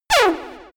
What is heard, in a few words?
synthetic beam; whooshes rises; hits impacts